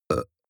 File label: burp very short; koerpergeraeusche; menschen; soundpool